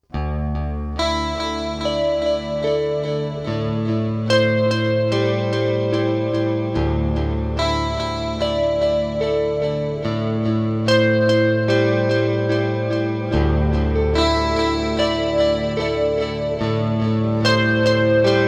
Dream Chorus

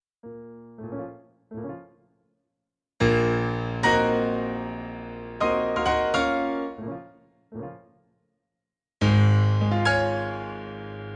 hp-307 scherzo no 2

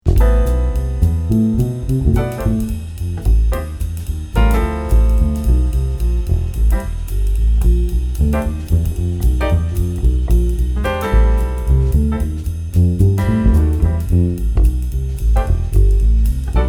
bass acoustic

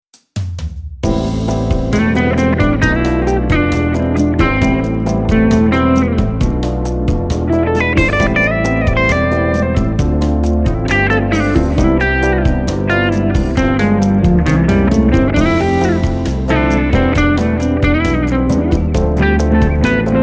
comp crunch

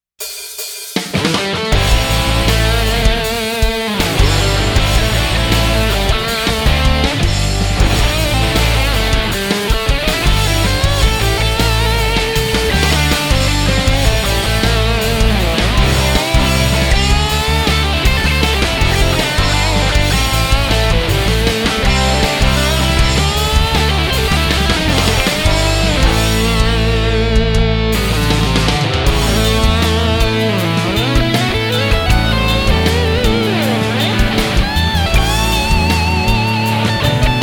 british rock

